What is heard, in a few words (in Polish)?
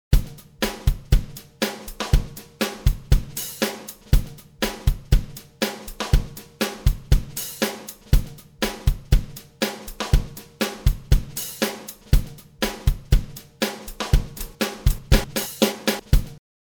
Perkusja